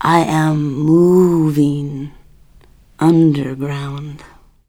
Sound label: Snake